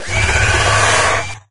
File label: imp sight; doom3